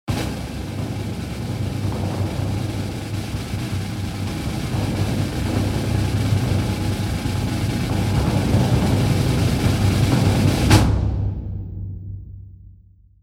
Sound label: drum roll sound effect; myro the musical